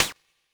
SD, dance drums hip

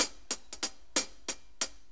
6-8 HiHats 60, HiHat & Ride Loops; Sonic Foundry (Sony Creative Software) - Loops & Samples Drum Tools

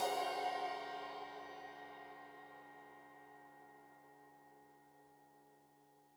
Crash 15in L edge, Cymbals Stereo

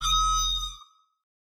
Phase Plant, bass

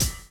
DRUM CHOP; AMG Gota Yashiki Groove Activator

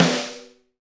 SD Real, Roland; Sound & Vision - Gigapack I

